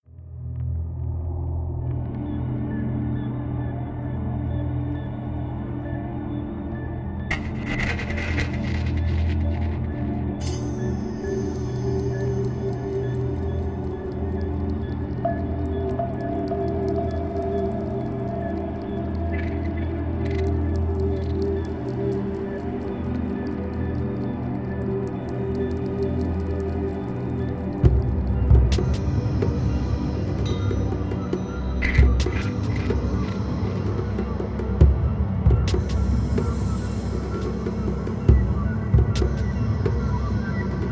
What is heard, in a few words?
Menu Screen